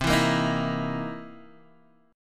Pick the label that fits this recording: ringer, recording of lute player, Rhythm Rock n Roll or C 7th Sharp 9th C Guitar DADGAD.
C 7th Sharp 9th C Guitar DADGAD